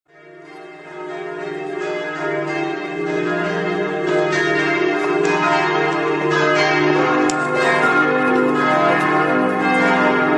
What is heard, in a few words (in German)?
Glocke sonntag